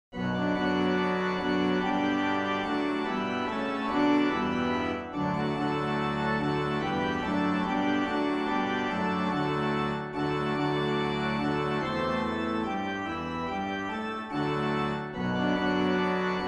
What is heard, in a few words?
Organ